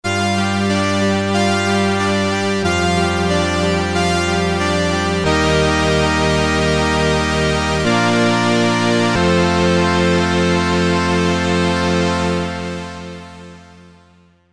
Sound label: JP Pulse Pad; Roland Boutique Jupiter JP-08 Synthesizer